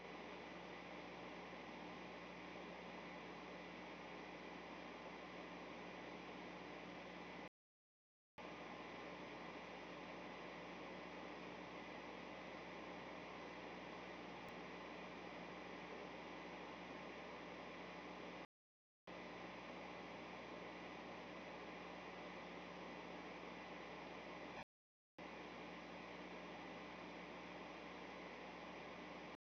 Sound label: fx8 noise comparison